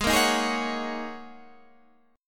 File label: G sharp 7th Suspended 2nd Suspended 4th, Guitar, Standard; strummed; chord; 16,13,13,13,14,14